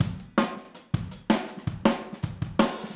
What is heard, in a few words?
Overhead, Drums, 162 BPM; Verse; Overhead ST